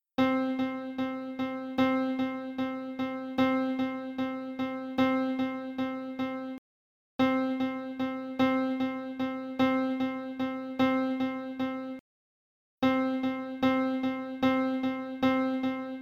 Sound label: quad Triple Duple